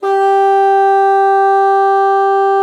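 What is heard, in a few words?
bassoon forte G3; Xsample VOL-6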